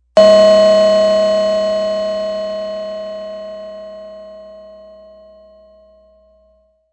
Ringer; Rings

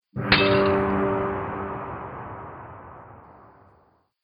Spell Destroy Golem, magic sound effects

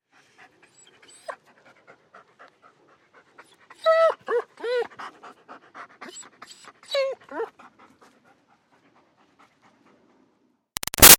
Dog German Shepherd Pants Whines Light; Dogs; Animals

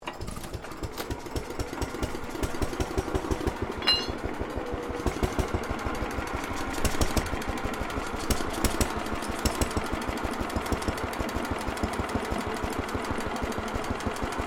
Engine Rolsen Tippet 1.5hp operating; 1936

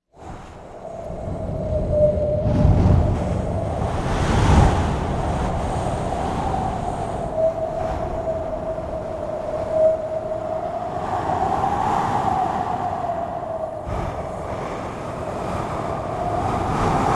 Bitter winter wind; Blizzard; Weather

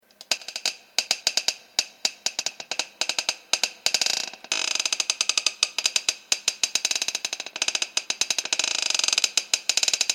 Creaking, Creaking stressing sounds.; Objects

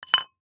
Clay Pigeon Down, Clay Pigeon Set Down; Clay Pigeon Set Down Upside Down Cement Surface; Miscellaneous Sport; Sports

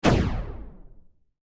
Science Fiction Laser Cannon Single Shot Gun; Lasers

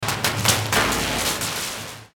Electrical Element Crackle; Production Element Imaging Element Accent Transition

